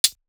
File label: HiHats